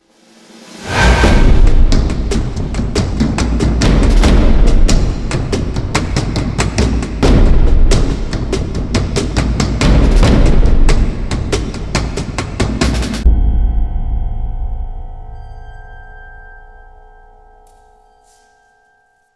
Ambush Stinger; Call of Duty World at War; PS3; Wii; Xbox 360

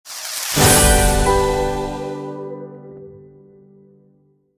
Event end Stinger; Candy Crush Soda

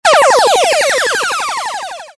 Angler shoot; Robot Wants Fishy

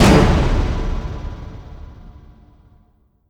Fail

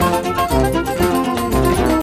mus cage forcefield; jungle world

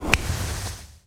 Fire Punch

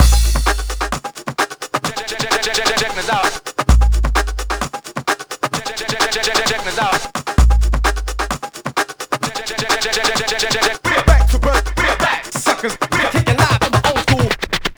Pluck

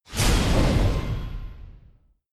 render complete

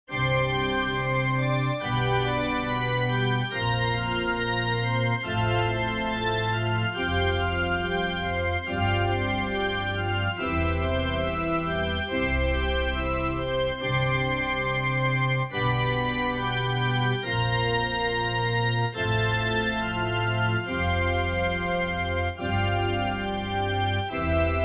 Midi Town; Shining Plume 2 Windows gamerip 2017

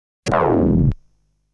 Enemy's bullet fire sound; legend of hero tonma

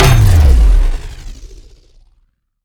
Sciweap Shot Large Blaster Gravity Disintegrator

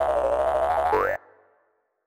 Collect Giant Blue Egg; Banjo-Kazooie

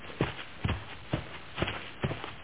Walk; Five Nights at Freddy's; FNAF 2

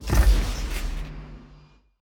Ui Select resource Stereo

